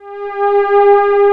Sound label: SOFT STRING